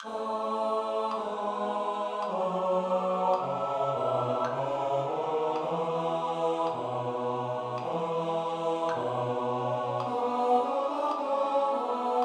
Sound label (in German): Bass; Kantorei